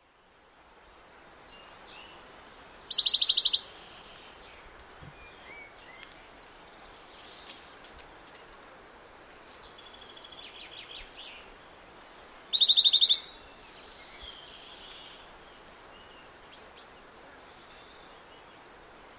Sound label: western bonellis warbler